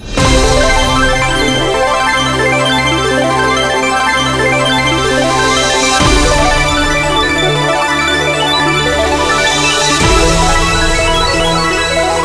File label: Big Win; Lock A Luck